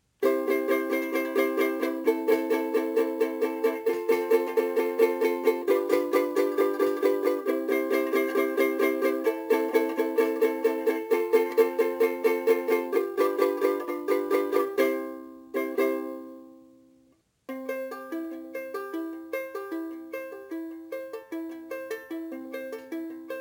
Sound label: fender canulele sample